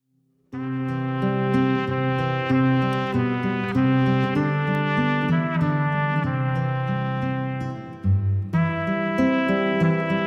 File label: Instrumental